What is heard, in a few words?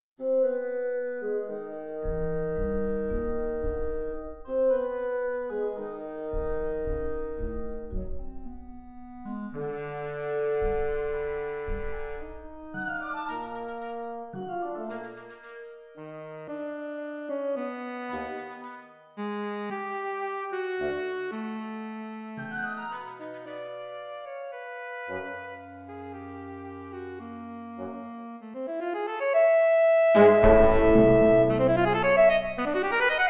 sax band